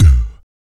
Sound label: VOC PERC, Vocal Planet Jazz FX BASS PERC